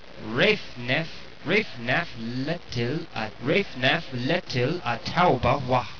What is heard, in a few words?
fanfare